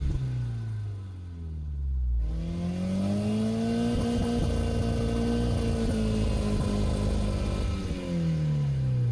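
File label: engine low icecream; Blind Drive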